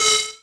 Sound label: funpark light floor; Tony Hawk's Project 8; XBOX